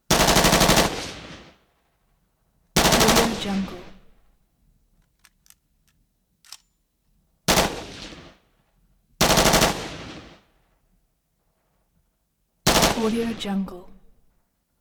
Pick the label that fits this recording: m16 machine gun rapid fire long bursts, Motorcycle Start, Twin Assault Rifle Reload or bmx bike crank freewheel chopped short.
m16 machine gun rapid fire long bursts